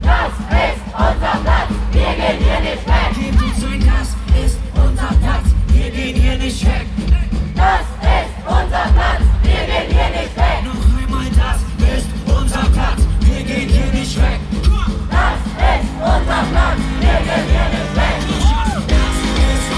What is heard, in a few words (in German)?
brass riot; fff aachen; internationaler zentralstreik